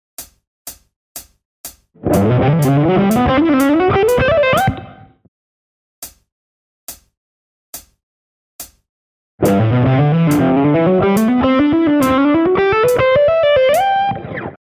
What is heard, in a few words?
legato